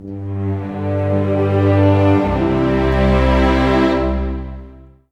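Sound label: 108, orchestra; East West; Scoring Tools; Lonesome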